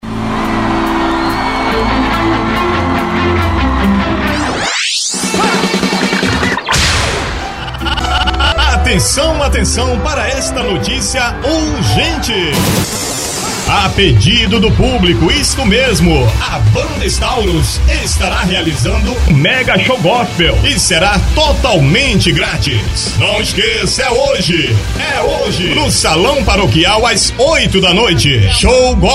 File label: banda